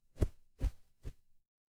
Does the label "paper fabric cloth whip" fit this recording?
Yes